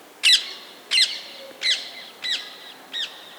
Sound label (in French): perruche a collier